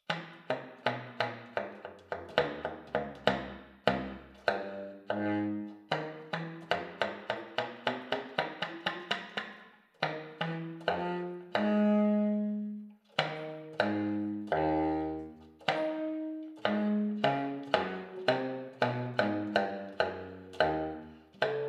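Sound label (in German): bar, Slap, NormalerSlap; Secco Slap; Open Slap. Bariton; saxophon; spieltechniken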